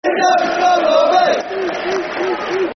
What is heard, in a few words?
Football Chant (Soccer Song), Coventry; Iain Dowie's Sky Blue Army; Coventry City Ccfc